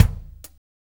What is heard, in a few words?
6-8 Loop, Full Kit Loops; Drum Tools